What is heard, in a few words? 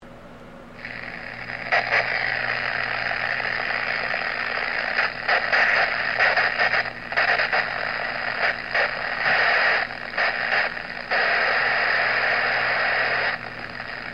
Static